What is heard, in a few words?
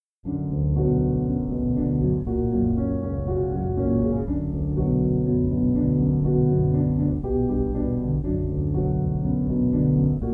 Relaxing Piano Music